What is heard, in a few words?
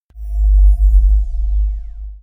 bass stab